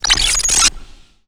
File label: mp player leave elec